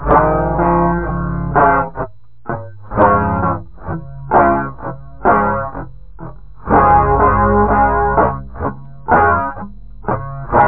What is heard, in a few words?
longtrain